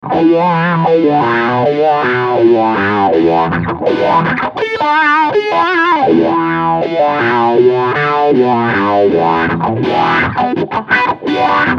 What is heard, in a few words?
Auto Wah